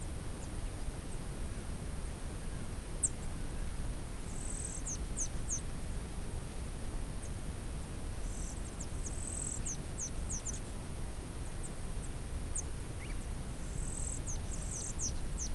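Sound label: bolivia uyuni desert ambience exterior; ambix; fvtt sonniss gdc audio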